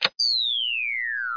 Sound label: dance off